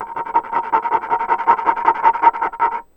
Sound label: Box Scratch, contact mic; Raw